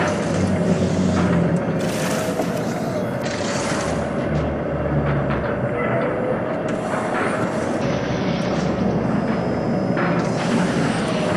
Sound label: Robot Arm Track Slow Mid; Boomtown; Titanfall 2